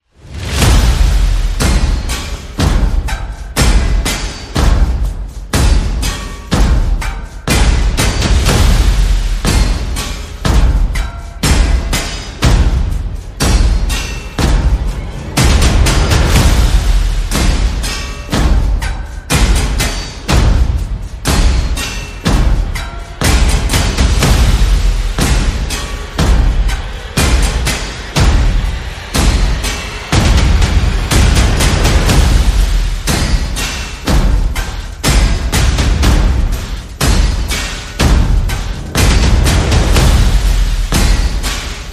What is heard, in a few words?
Percussion and Effects